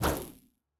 throw ball shock; CrossCode; battle

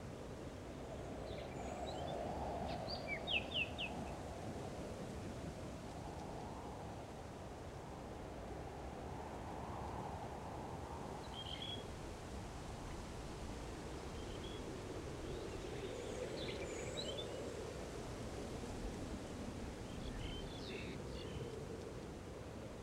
loop super birds; World Of Goo

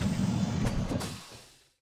metal door

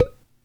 pipe Hit; World Of Goo

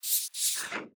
icebugs enter bot; mine; battle

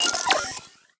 equip sound

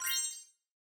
drop normal bronze

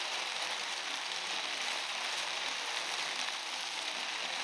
sliding softwet; The Swapper

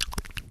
wiggle; World Of Goo

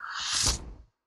door close

